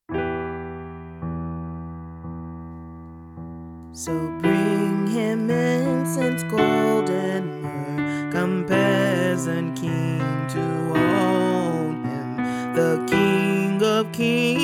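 What Child is This Soprano with Lead, christmas choir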